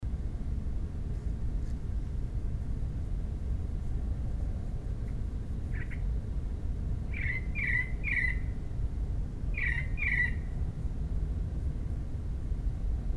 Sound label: Northern Mockingbird talking in sleep